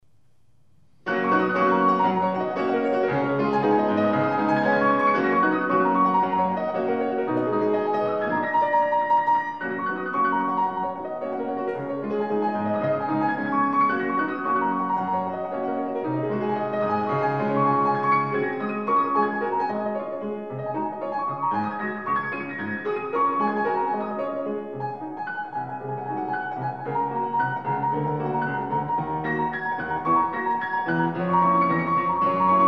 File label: Pro Piano; Altan Pro Piano; Chopin Black Key Etude